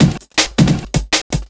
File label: Beat, Futurist Drum & Bass; Drums & Percussion